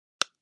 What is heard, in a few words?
Light Switches Click